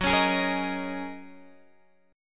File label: Gb 6th Add 9th Gb down Guitar Open G